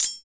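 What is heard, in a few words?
PER PROCU, Procussion, percussion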